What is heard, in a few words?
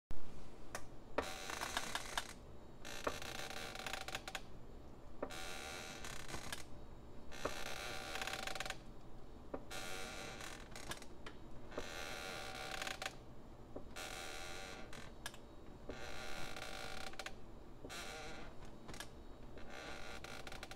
Creaking Floorboards; haunted house